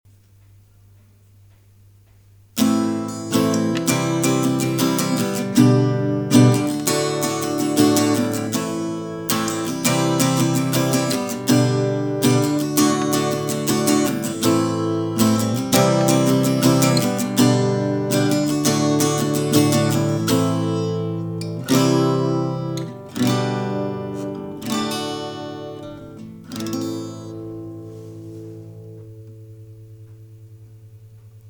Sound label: Chord Progression Steel String